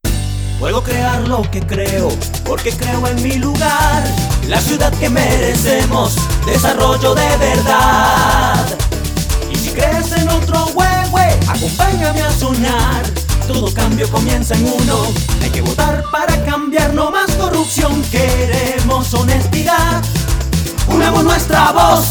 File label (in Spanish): Jingle Completo